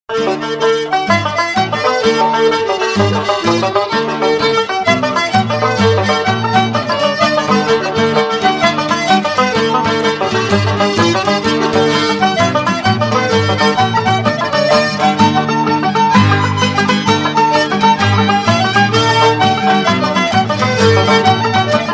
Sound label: Jig